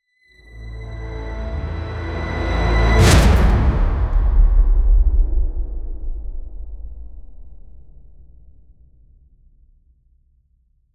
sekunden cinematischer

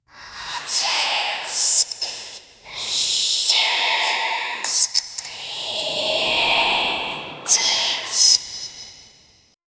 demonische sound kullise, demon

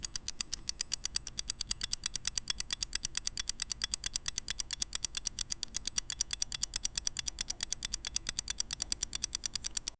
ein mechanisches uhrwerk, clockwork

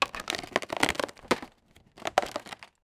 holzkiste zerbricht; breaking

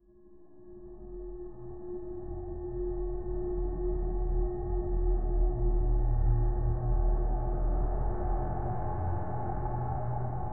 dstere und demonische sou, dark

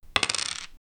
eine schachfigur bewegt, chess

